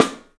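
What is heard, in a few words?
PICOLO SNRS, snare, drums; 3D Sound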